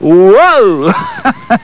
whoa; cstrike; VolleyBallMod

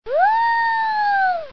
woo; cstrike; sank sounds

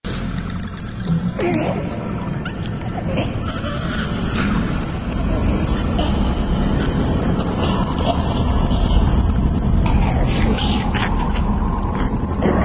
horror house ambience; cstrike; cs evian

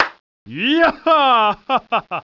man joy; cstrike; cso emotion

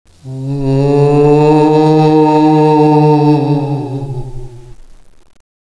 halloween moan; cstrike; de dust2 halloween

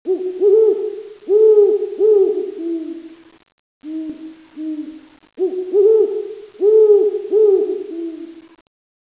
castle owl; cstrike; de dust2 halloween

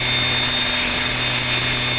gauntlet spin; cstrike; Quake3

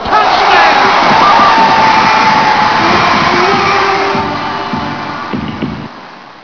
cheer; cstrike; kickball

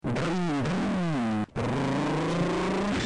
brmm; cstrike; sank sounds

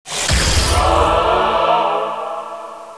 bomber; cstrike; DICE

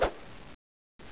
knife slash; weapons; cstrike; next21 knife v2; flash knife

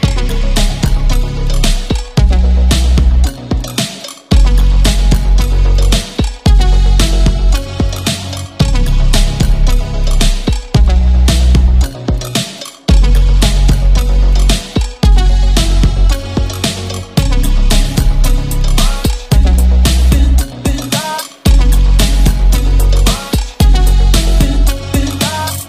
dance; cstrike; fy beachy

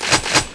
weapon pickup; cstrike; Quake3

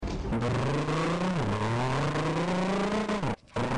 accelerating brm; cstrike; sank sounds

